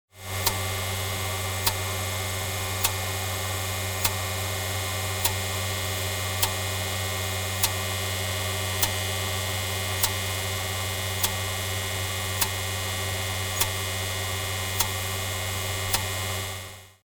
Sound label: milking machine